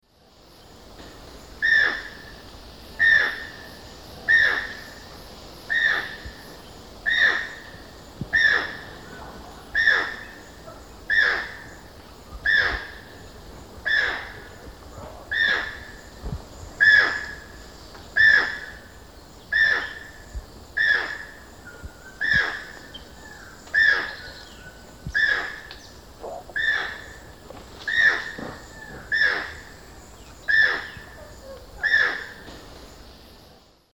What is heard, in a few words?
Ramphastos vitellinus, calls or song; S. America; Eastern Lowlands